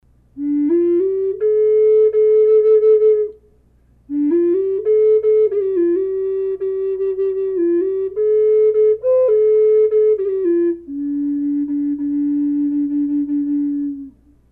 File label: black bear low d sequoia, flutes; collector flutes